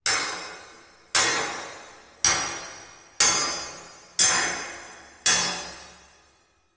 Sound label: impact metal steel strips large reverb x6